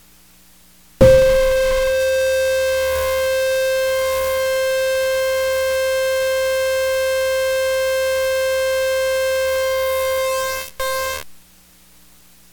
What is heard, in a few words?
jd800 noise